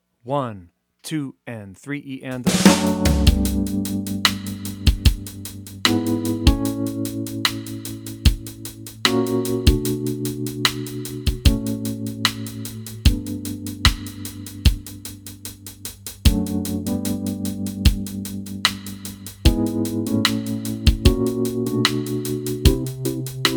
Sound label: Sixteenths Chart no bass